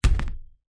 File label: food watermelon down on surface heavy bounce; zapsplat